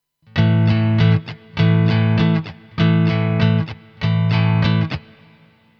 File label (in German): Schlagmuster Übung, gitarre